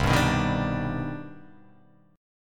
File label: C Minor Major 9th C